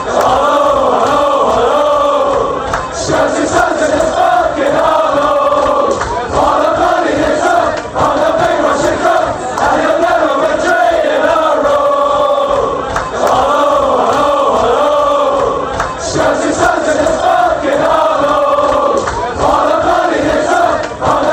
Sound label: hollow hollow, fan chants